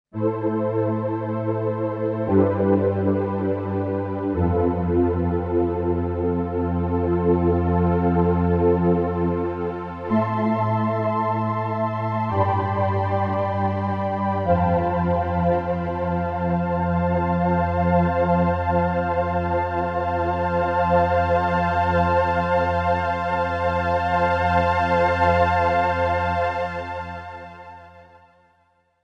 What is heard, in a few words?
Lush Strings, Yamaha SY77 pads and textures